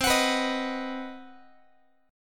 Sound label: C Minor Major 7th Flat 5th, Guitar; strummed; 4A,4E,5A,5D,5G#,6C#; JGuitar